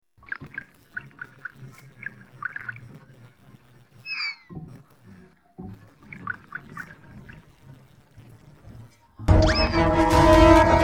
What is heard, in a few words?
no vocals; unik cara induk bebek melatih anaknya